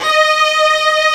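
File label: ARCO VIOLI, Tremolandi Violins; E-MU Producer Series Vol. 2 – More Studio Essentials; Composer; Tremolandi Vlns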